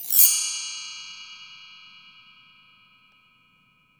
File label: BELL TREE; AKAI S-Series CD-ROM Sound Library VOL-5